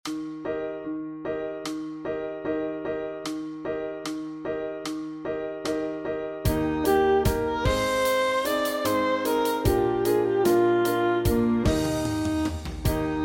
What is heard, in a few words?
Sax Study